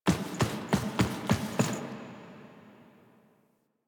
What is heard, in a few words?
Foley C; Drifter; Warframe; Quests; The New War; Narmer Outpost; Chamber Archon Intro